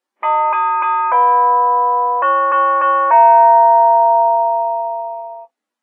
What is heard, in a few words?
beethovens 5th, door chimes